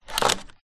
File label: leisure toy car down on surface